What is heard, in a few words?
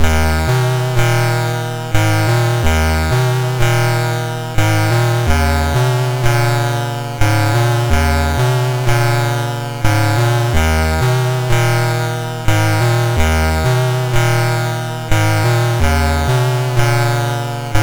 Frantic, MOS6581R4